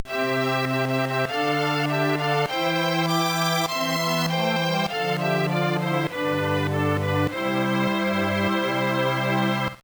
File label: Jingle